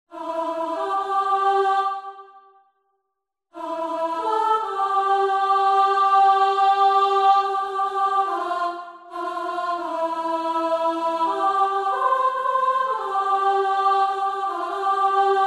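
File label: Soprano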